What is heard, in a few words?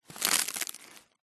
leaf large dry crunch crush stand on